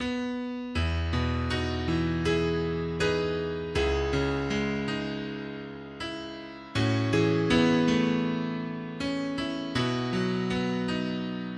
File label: Piano Solo